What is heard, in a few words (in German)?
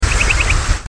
Alpenstrandlaufer, Vogeltöne